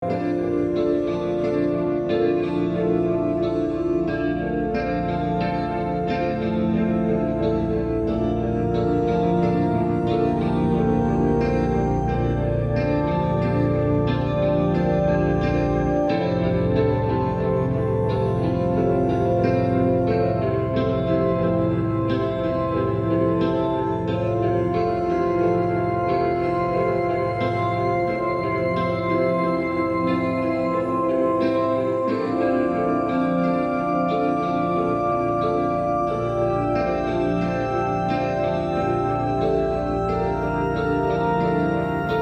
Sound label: Hopeful (Loop)